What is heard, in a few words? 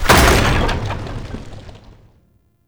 Spike Trap Fall; Objects; Ode Lantern Crate